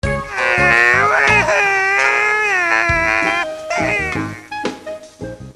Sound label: funny puppet clown crying